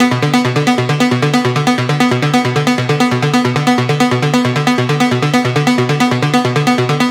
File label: Synth Loop B 135, Techno; Ghosthack; AC2024 - Day 1 - Techno Sounds 2025